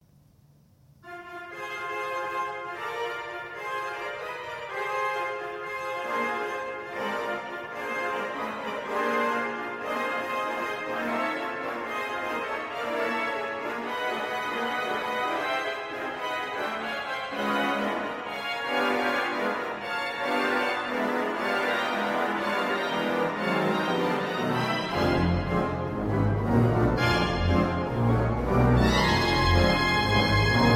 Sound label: stride dance